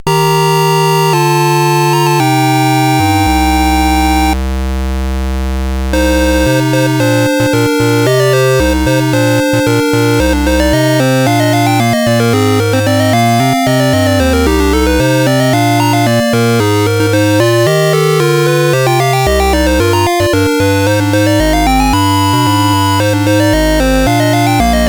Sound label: Dragonworld sid CSG8580R5